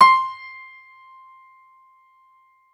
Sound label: Piano Grand c5 hard; TrashLibrary